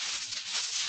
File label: grind yeast